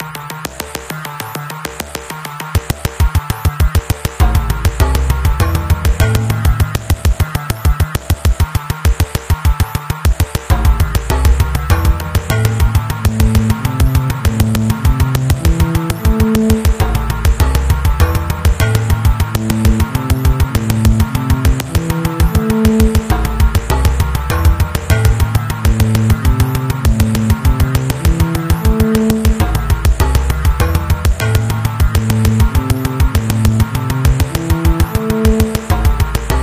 retro boss fight loopable

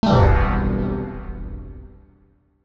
Decline UI Menu

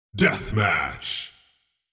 death match

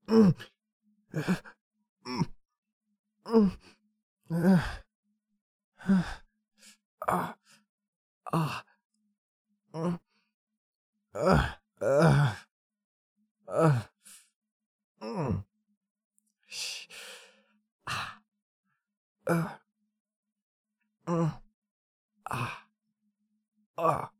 victim in pain

